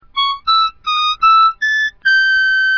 Blow Bends

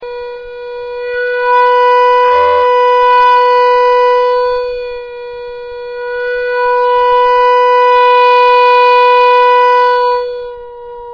Resonance

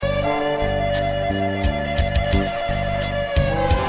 Shake

